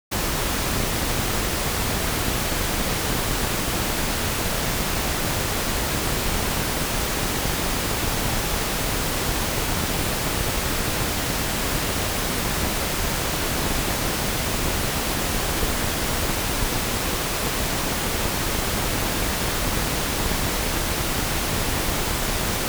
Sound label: Pink Noise at -20dBFS L+R (uncorrelated from 0-42); Stereophile; Stereophile Test CD 2